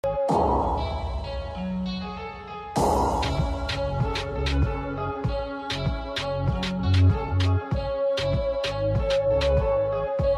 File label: Instrumental